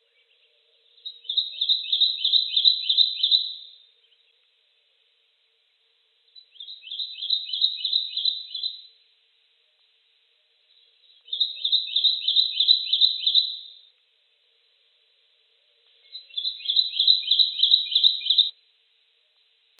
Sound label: Kentucky Warbler